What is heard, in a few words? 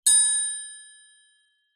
musical triangle single hit resonate